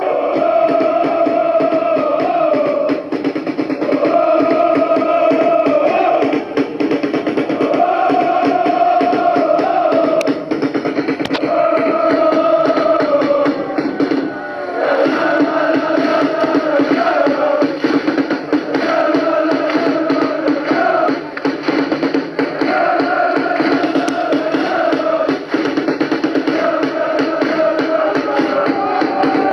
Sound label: dale cavese, fanchants